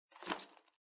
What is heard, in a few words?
video game equip